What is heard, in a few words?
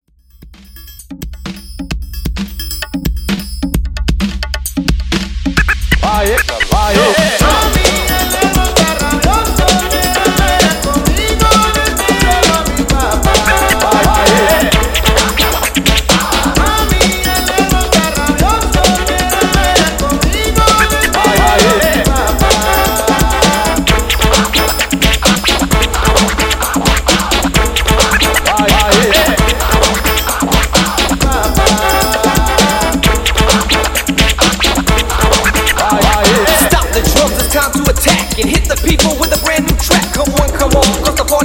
Old School Redrum, 131Bpm